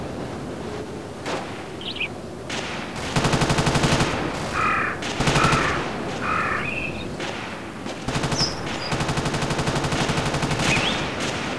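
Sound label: forest ambience; dod revolted steam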